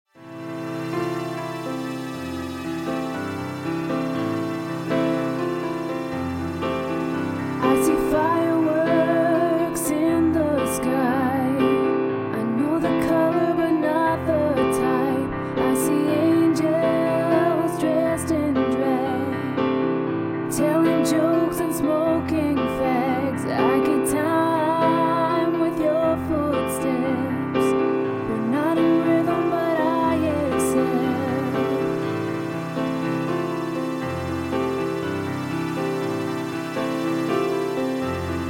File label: fireworks reprise